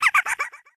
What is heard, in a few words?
gekko laugh